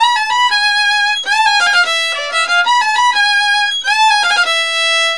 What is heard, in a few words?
VIOL R, Balkanish Traditions; USB Soundscan vol.63; AKAI